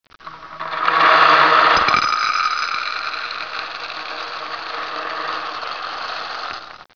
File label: Box Ruf Bowl